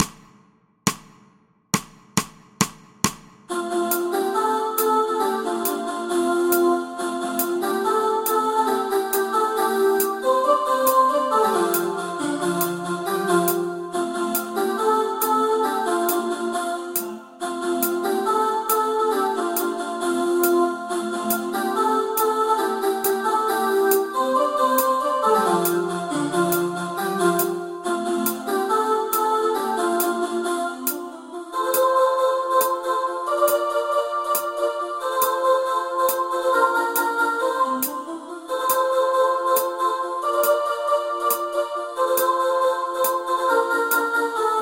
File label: soprano